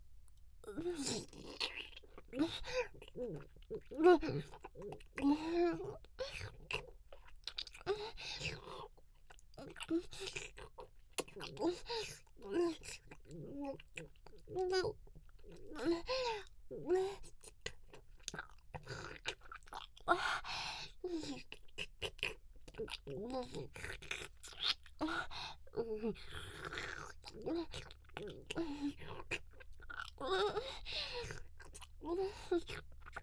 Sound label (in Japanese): 激しめフェラ唾液混じり